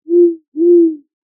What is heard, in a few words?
animals owl hoot night